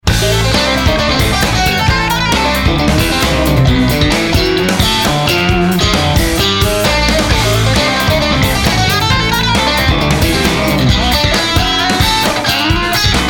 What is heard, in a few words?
Splash, Junk Funk